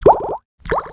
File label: DRIPS; Renaissance